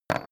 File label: metal small wood put down short; industrie wirtschaft; metall